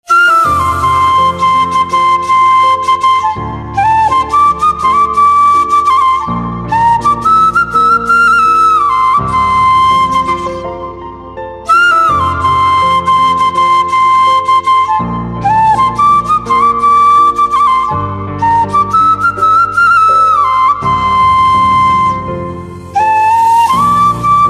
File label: Flute Slow